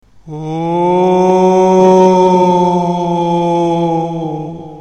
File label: moan low pitch echo